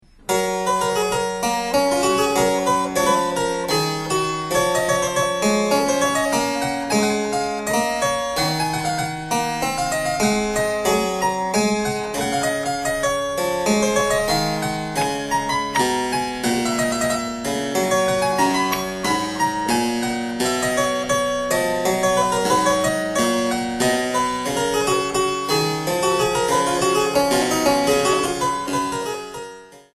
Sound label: bologna harpsichord